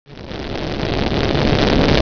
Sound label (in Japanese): gogo